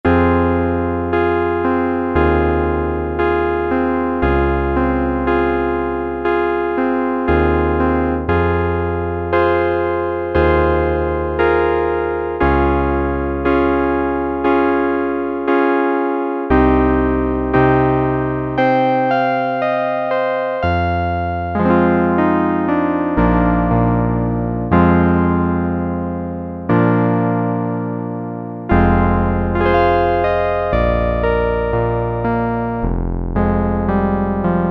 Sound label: Piano I, Roland Juno-106; Factory Preset